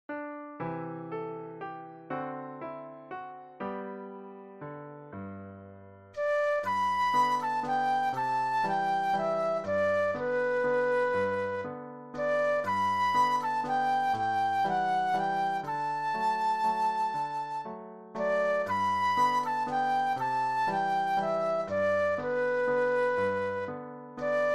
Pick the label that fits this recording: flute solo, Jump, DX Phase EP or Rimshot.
flute solo